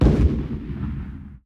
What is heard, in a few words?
explosion; torpedo